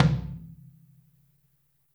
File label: FLTM OH L, Kit OH Mic, Drums Percussion